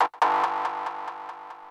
KEY SHOT TEKNO 140 A; Techno2; Singleshots

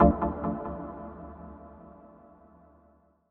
TECHNO 140; Techno2

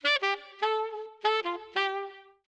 OLDSCHOOL BRASS 96

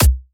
kick, drum hits; Anthemic

